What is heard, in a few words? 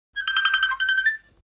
messenger call bird